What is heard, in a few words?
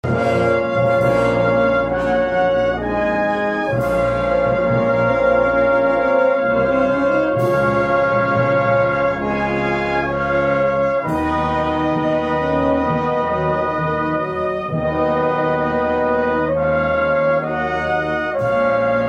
meditation trombone